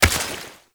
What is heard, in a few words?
Step Water, Footsteps